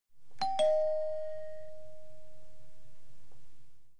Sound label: Bell, Bus